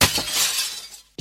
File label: glass shatter